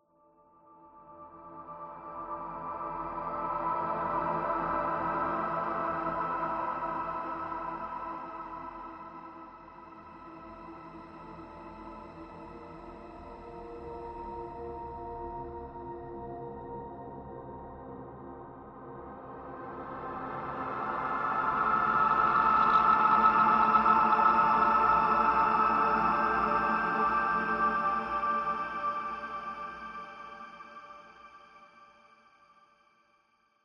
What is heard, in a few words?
ambient